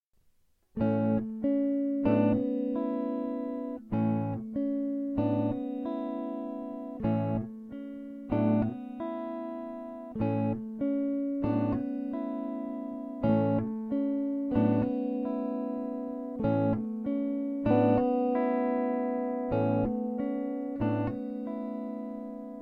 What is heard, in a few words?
GTR chords arp dry